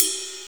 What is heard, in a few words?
Ride; osu